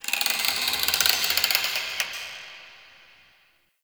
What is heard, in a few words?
Zariman Wood Creak; Warframe; The New War; Ambience